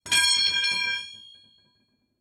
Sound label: Metal, Rebar, Drop, Concrete, Low Height, Medium Distant; Airborne Sound; Elements Metal